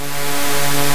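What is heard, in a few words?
buzz sweep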